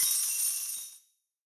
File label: big Win End; netent; eldorado